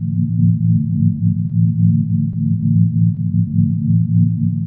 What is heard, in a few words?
portal ambient loop, portalgun; Source Engine; Portal 2